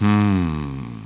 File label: humm; BattleSNDS